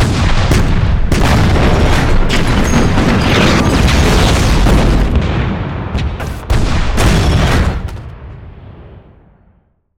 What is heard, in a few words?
Explosion Series